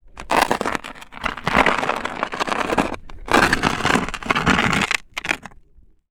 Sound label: Sand Pebbles; TOOLKIT vol.2